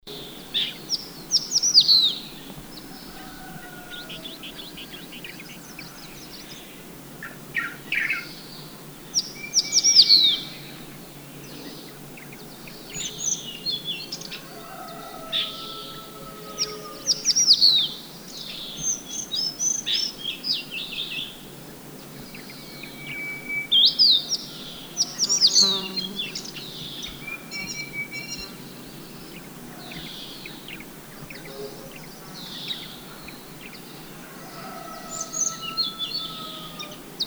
Crested Bunting Song, Guangxi Shanli

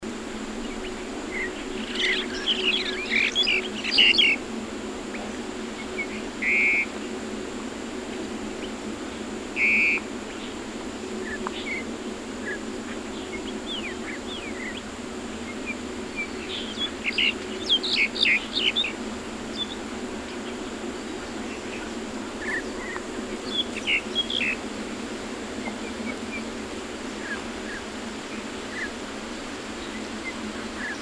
Black Collared Starling, Hong Kong Mai Po; etourneau a cou noir sturnus nigricollis

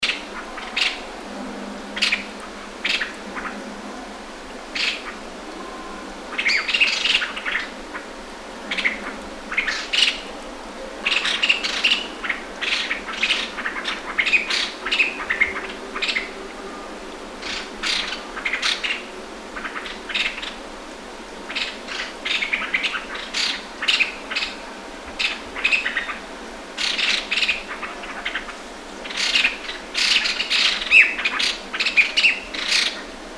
Masked Laughingthrush Group Alarm Call; Shenzhen Wu Tong Shan

